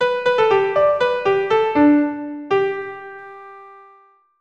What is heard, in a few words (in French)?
carillon